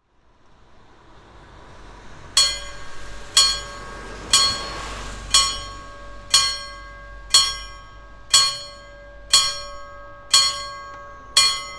ding

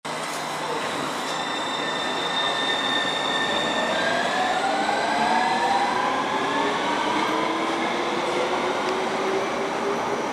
Metro G6 Depart Ground, Metro G5/G6 afgang; Emil Glentvor, Oktober 2022, Metro

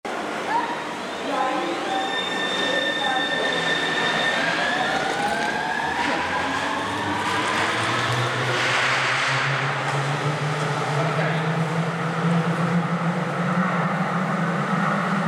Metro G5 Depart Tunnel, Metro G5 afgang, Tunnel; Emil Glentvor, Oktober 2022, Metro

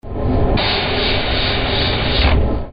ma door

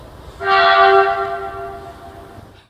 mz horn; Jacon Grona, 2016

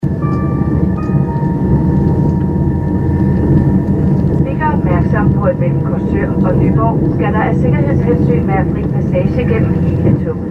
tunnel

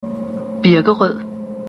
birkeroed; Birkerød #2; 4. generations S-tog

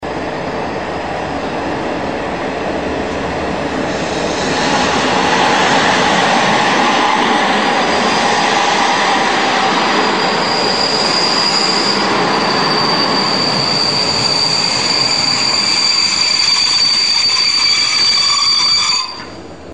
brake; ME & vogne #2

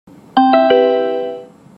jingle

